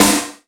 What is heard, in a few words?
SD VRB, Yamaha RY-10